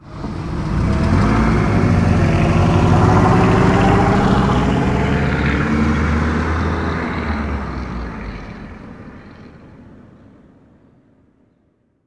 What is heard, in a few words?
American Large Car Drive Off